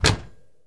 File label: Firebird Door Close; Automobiles